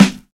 00s dry steel snare drum sample g key, acoustic snare sound tuned to